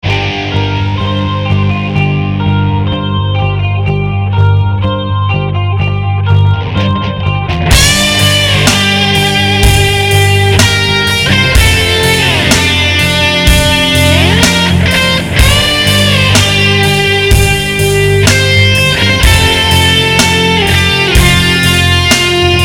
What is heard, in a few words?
Live Electric Guitar